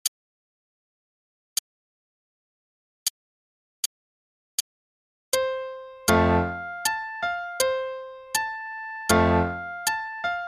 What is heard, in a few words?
Reveille (both parts, qn=80); Faber Adult All-in-One; Adult All-in-One Book 1